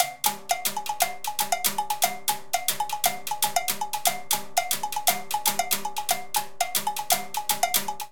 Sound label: Descarga A Cowbell Timbales 118bpm; Latin Percussion; Ableton; Factory Packs